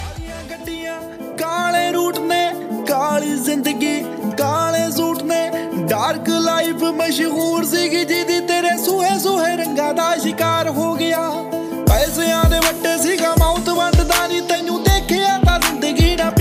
Dark Love Ringtone

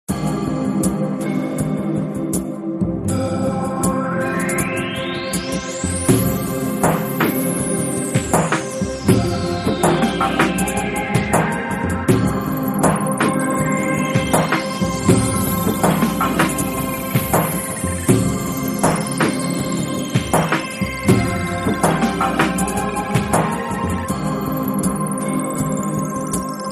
New iPhone Ringtone; ringtones

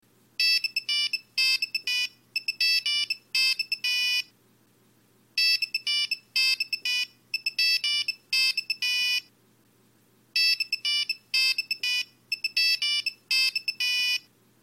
Nokia Kick Retro Ringtone; ringtones

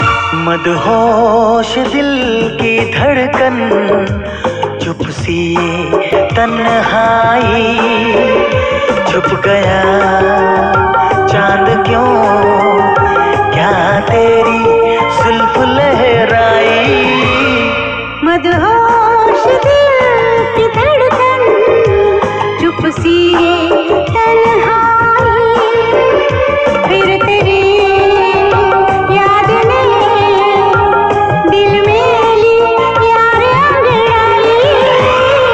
Old Song Ringtone

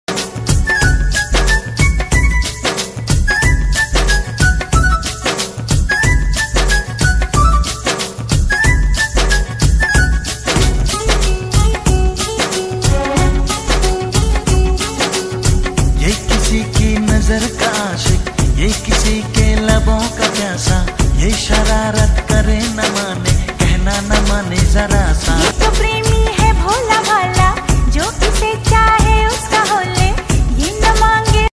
Old Romantic Hindi Song Ringtone

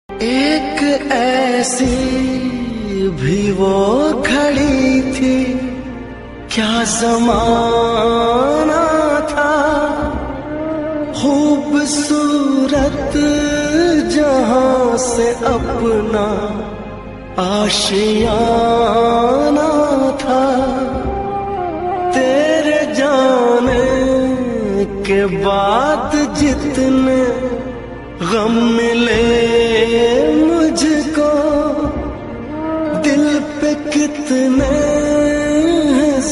Heart Touching Song

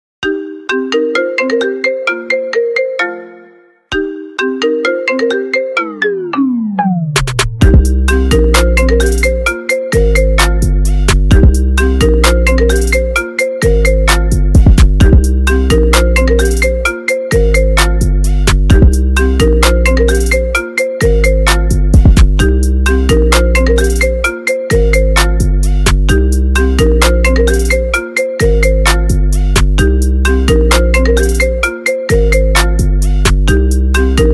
Apple Ringtone; ringtones

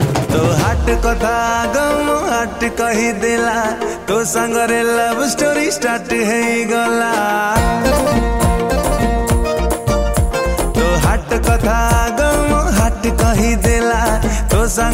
Odia Song Ringtone

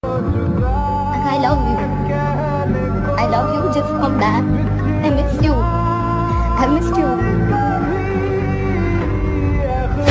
Breakup Ringtone

